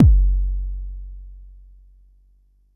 Bassdrum; Jomox Xbase09

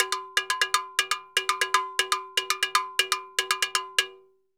Timbaleta Bomba 120, Drums & Percussion, 120 bpm; Best Service; Festa Latina VOL-1

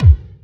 Sample, Roland MV8800, BREAKWILDKIT

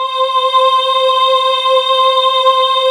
PEACE CHORUS, ROLAND D-50; Rubber Chicken Software Sample Bank

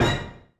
Dance Kit, Korg 01W

